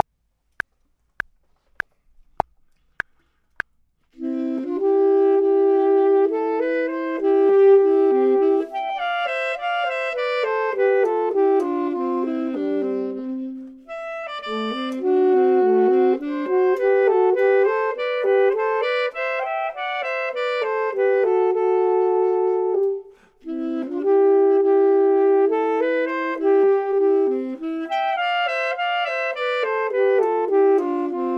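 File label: both, Saxophone and Clarinet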